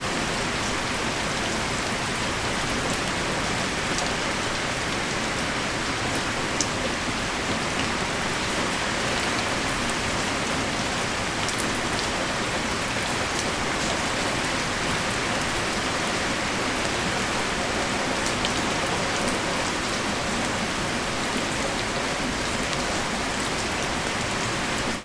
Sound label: weather rain med light back yard; sound effects one